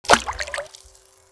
water; Mafie 1

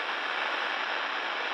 noise; Mafie 1

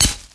bush; Mafie 1

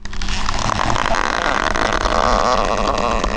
tree; Mafie 1

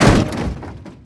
crash; Mafie 1

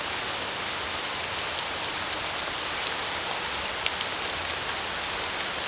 rain town; Mafie 1

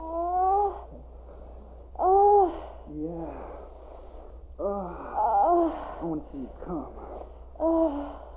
sex; Mafie 1

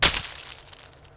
bush; Mafie 1

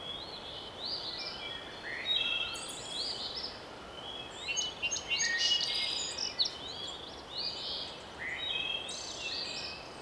out forest; Mafie 1

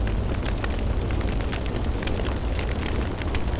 burn man; Mafie 1

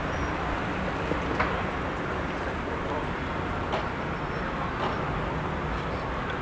ambi town; Mafie 1

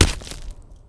grass; Mafie 1

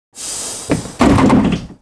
door; Mafie 1

